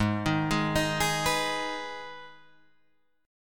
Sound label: G sharp Minor G sharp down Guitar Open G, chord